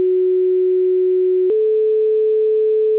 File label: Bryn Mawr Tones, fire company